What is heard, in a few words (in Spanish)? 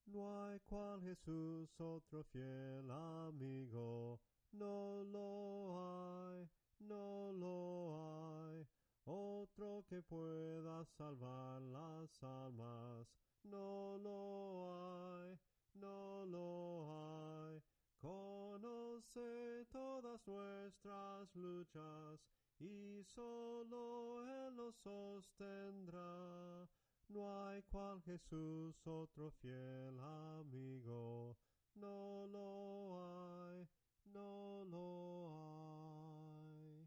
NO LO HAY SOPRANO CANTADO; cantos del camino